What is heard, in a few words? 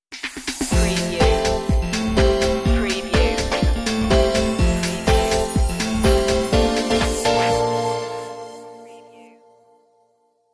pop vibez vibes